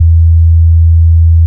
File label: Sub, Jungle; Bass; Blu Mar Ten; Jungle - 1989 to 1999